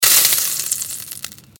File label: Scald; casualtiesunknown